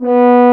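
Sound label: French Horn mf B1; Digital Sound Factory Virtuoso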